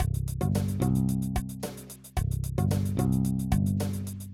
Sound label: bass drums